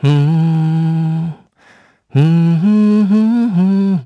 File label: Hum, jp; Zafir; kingsraid